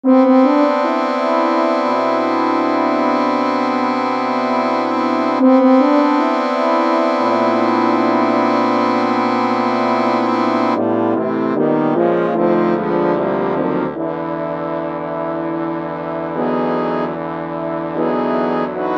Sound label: Cloches